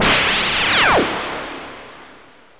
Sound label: gun, Chiptune; SMB3Memes; EoSD Chiptune